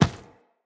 Inf Medium Run Default, Footsteps, Enemies, Infested